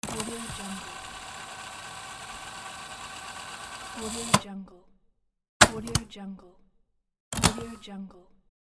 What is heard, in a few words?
cassette tape player